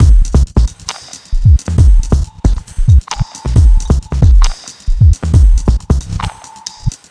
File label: Bpm135 Break, Dubstep, Drum Loops